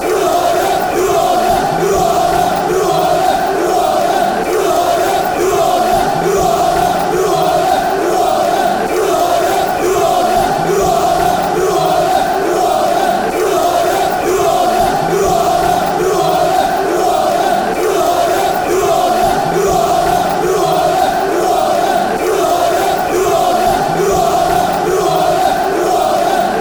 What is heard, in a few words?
who are ya, fan chants; huafc